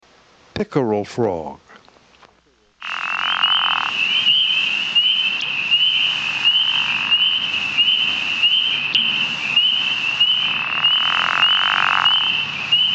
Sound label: Pickerel frog (Rana palustris); Family Ranidae - True Frogs